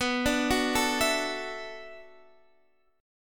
B Minor 11th B down Guitar Open D

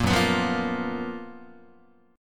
F sharp Major 11th Guitar; strummed; JGuitar

